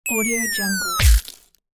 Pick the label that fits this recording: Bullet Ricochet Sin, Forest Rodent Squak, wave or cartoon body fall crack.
cartoon body fall crack